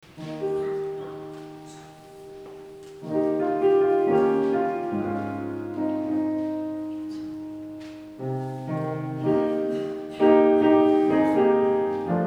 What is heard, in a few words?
Offertory Hymn